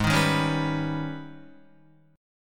G sharp Minor Major 11th G sharp, Guitar; strummed; JGuitar

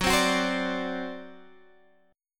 C Suspended 2nd Flat 5th Gb

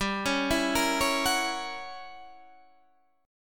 F sharp 7th G, chord; F#7/G arpeggiated

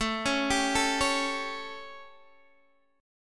G Suspended 2nd Flat 5th A, chord

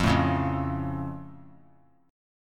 F Augmented F; strummed

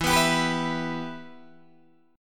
E-Minor, Guitar, Open D

